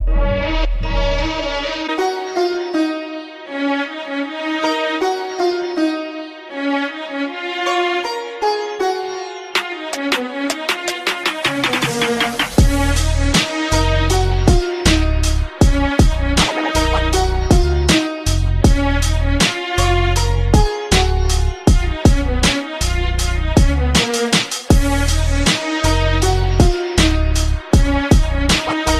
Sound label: ringtone, punjabi